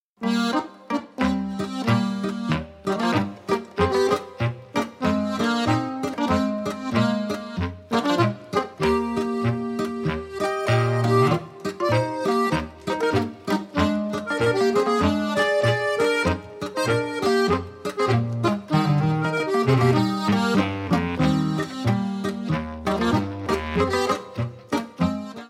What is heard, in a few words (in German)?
boarischer; stoaberg